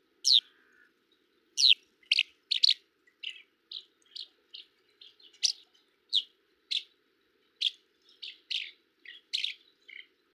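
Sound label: skylark call, birds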